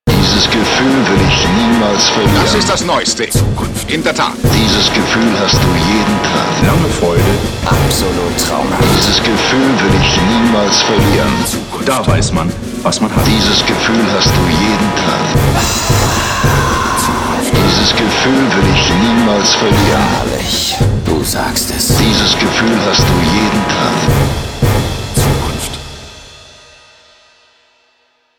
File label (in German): WERBEMARSCH